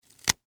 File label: Rope Cut Twine, Industry, Rope, Cut, Twine; Hand Tool; Tools; BlastWave